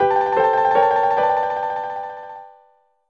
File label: Affinity Jingle; tomodachi collection; DS